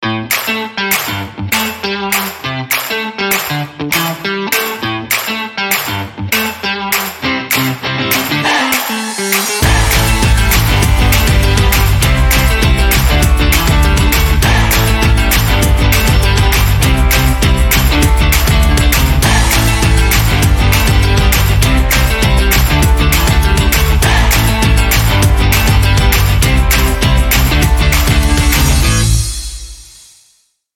Modern Commercial Indie Rock Advert Upbeat Uplifting Music Loop